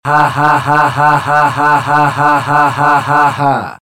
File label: hahahahahahahahaha